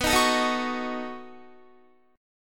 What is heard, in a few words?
B 9th B down Guitar; strummed